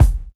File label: studio bass drum single hit e key, Royality free kick drum sound tuned to the E note; kick drum samples